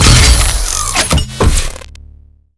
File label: gauss sniper fire; doom gauss